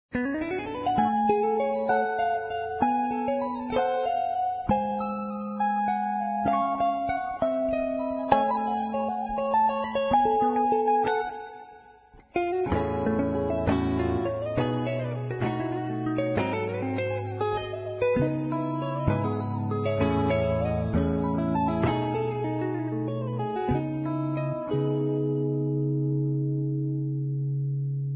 Guitar Electric; Zomboid; Lifestyle

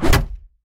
2x4 Hit; Scrap Weapons; Zomboid

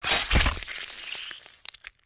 Long Blade Crit; Scrap Weapons; Zomboid

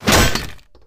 Heavy Floor; Scrap Weapons; Zomboid

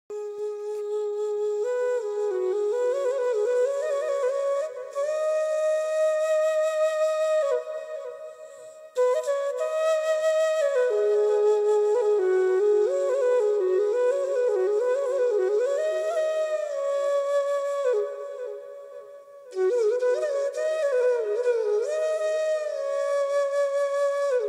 flute; Zomboid; EntertainYourself

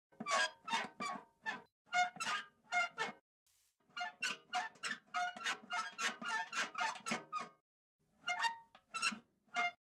Cut Sheet, Scrap Weapons; Zomboid

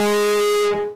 Keytar Failstate; Instruments

